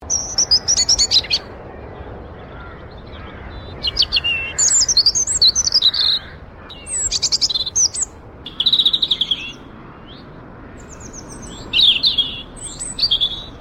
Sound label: Robin, birdsong